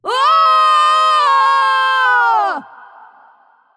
Falling; Paladins; Princess

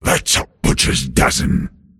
last hit pain; Dota 2; pudge; arc

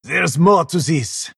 move pain

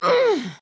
Grunt Hit

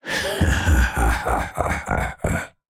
Menacing Laughter; Reaper; Voted Epic; overwatch

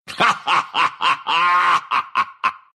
laugh, ru; Lycan

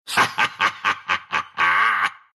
laugh, ru; dota2

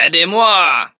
low

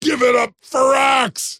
Lasthit pain; Axe

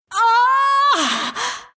pain ru; Dota 2

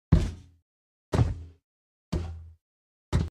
Iron Golem Idle; minecraft

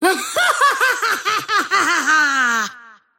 kid laugh; Invoker

